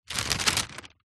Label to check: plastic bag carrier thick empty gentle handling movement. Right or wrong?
Right